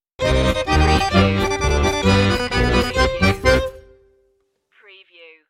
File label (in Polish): kresowa polka stinger